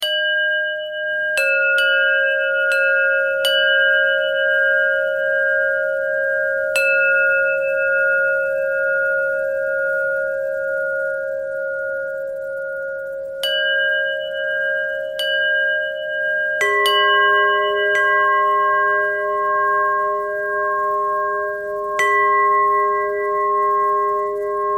bell bell thread simple, bells

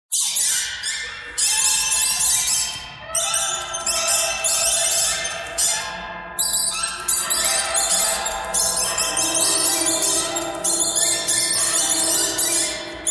birds birds thread harmonic

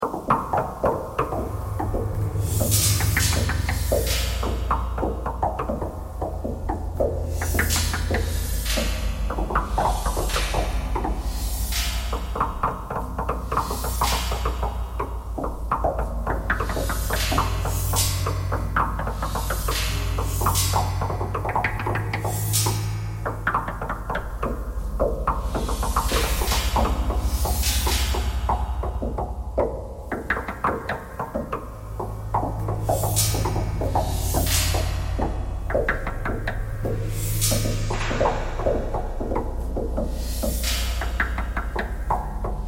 knocking thread noise all

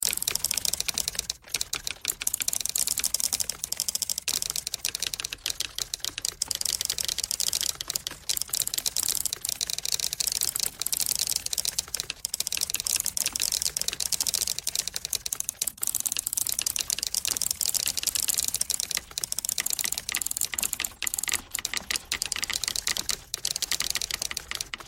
typewriter thread all

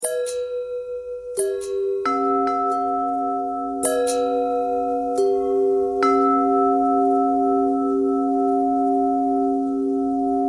bell long bell thread simple, bells

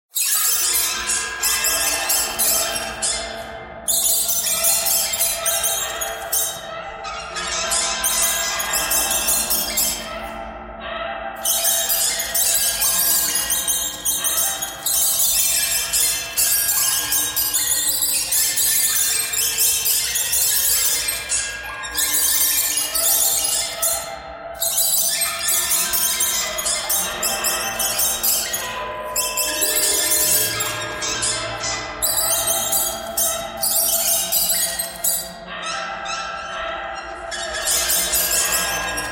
birds thread pentatonic

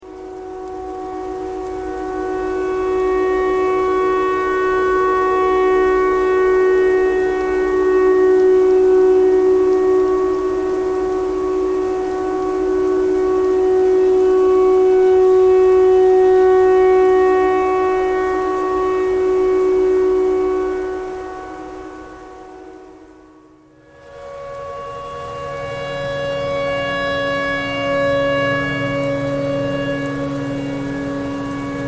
tornado siren tornado siren thread simple

